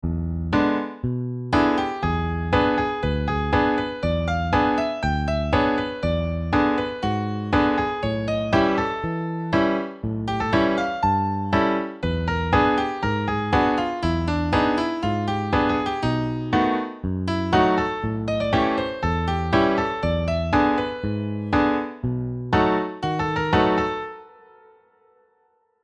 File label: 12 bar